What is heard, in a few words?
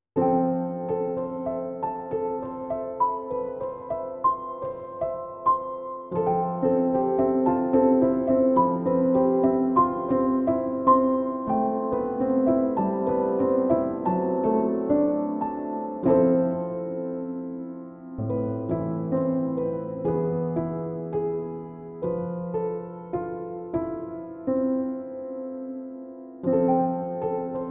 Changing Times Underscore, Period Drama Emotive Piano